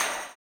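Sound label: tambourine, 160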